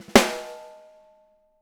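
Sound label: 6X12 ALUMINUM; Living Drums; Sampleheads; Peter Erskine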